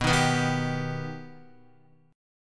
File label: B Augmented B down Guitar; strummed; JGuitar